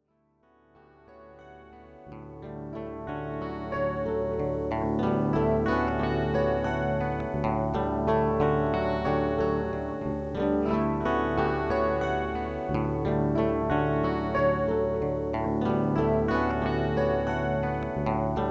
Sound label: loop